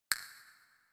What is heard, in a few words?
click; adventurecat; peacefree